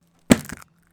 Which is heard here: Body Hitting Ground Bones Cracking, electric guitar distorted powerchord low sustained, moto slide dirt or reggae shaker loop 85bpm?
Body Hitting Ground Bones Cracking